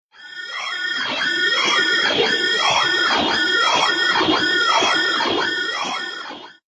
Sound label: Alien Alarm